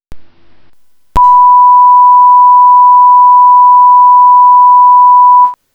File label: 1kHz